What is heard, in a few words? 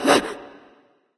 surprise; smite; voicepack; hel